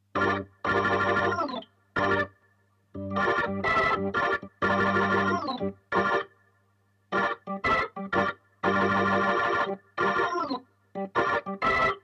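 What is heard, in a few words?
organ, Hybrid neural guitar overdrive; Morphdrive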